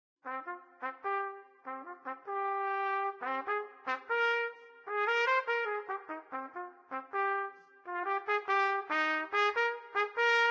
Trumpet Leaps and Bounds; Instruments

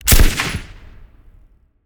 Firearm M60 Fire; Firearms; Zomboid

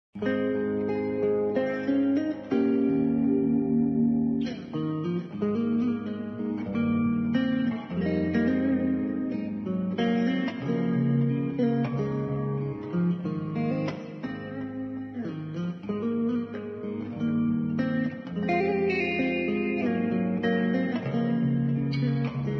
guitar electric

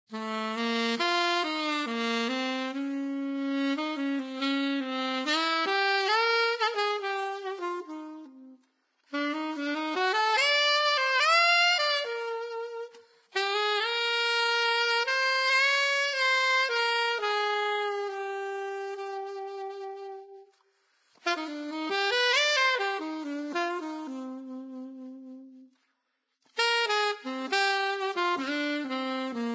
Saxophone Etude; Condit Etude No2

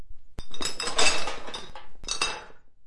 dumping Trash; Zomboid; Expanded Helicopter Events

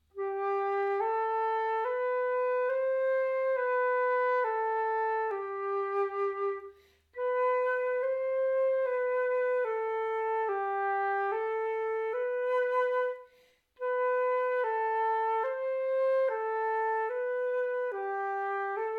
flute; Zomboid; EntertainYourself

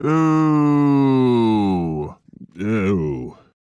Man Booing; voice; Zomboid; Lifestyle

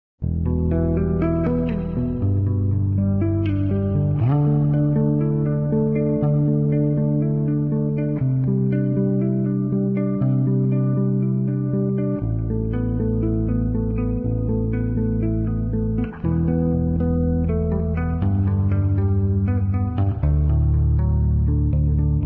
Guitar Electric Bass; Zomboid; Lifestyle

